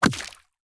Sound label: punch